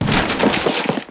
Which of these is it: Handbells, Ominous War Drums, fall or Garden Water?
fall